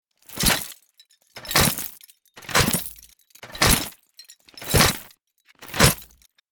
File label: Medieval knight armor clanking walking